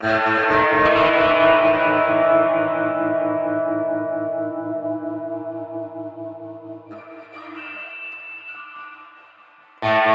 Spacious Reverb Guitar

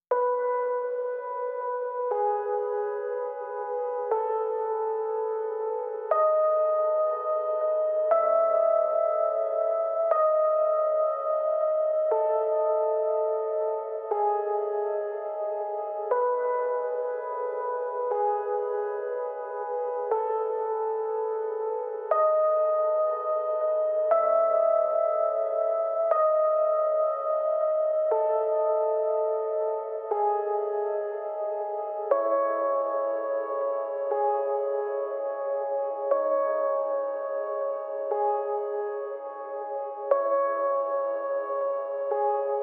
Piano

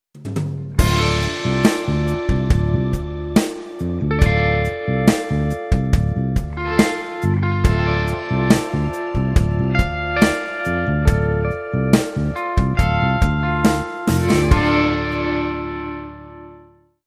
Emotional Darkness

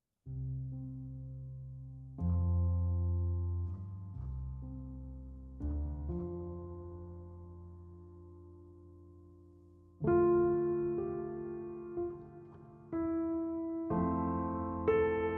Piano

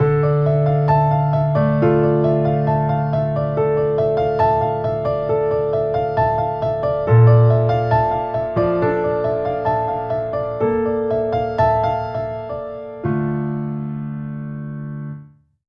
Piano Intimate

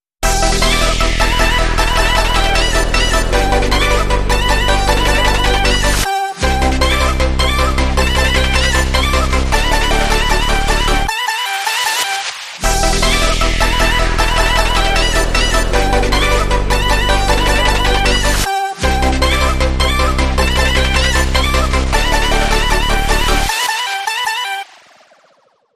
No Drum

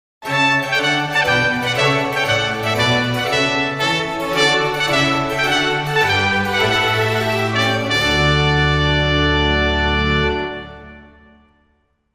Trumpet Tune Sting

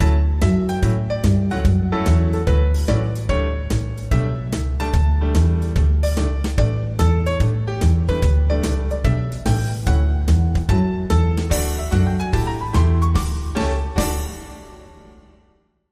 Pizzicato, Sting

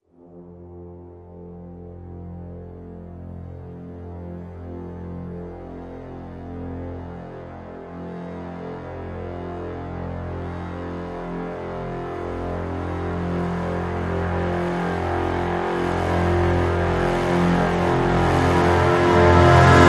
Brass Swell Lo (F); CRES

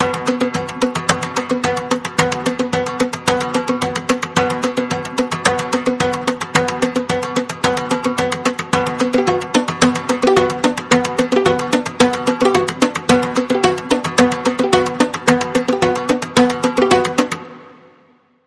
Peculiar Guitar Rhythm

